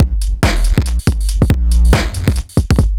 Kit 7 Odd Sig 140; off the grid

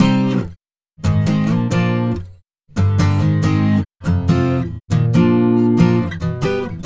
Acoustic Pop Guitar 140BPM Cmin; Vengeance - Essential Clubsounds Vol.4

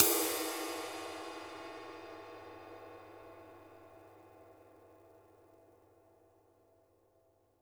flat ride; JazzFunkKit

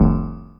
Stabs, Elektron MachineDrum SPS1 MKII

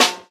Snare, jungle breaks drum sounds

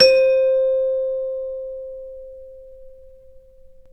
CEL, ORCH PERC

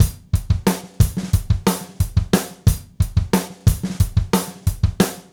Gretsch groove 90 BPM, 1967 Gretsch Kit drums; Sonic Foundry (Sony Creative Software); Vital Drums

